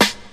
2000s sparkly hip hop steel snare drum g sharp key